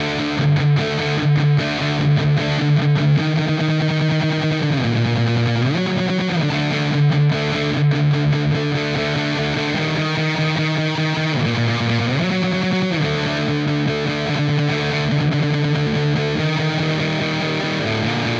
Neural DSP Quad Cortex Neural Capture Orange Rockerverb